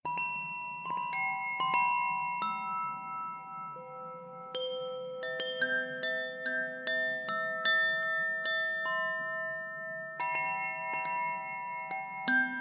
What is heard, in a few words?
autumn wind chimes twilight sounds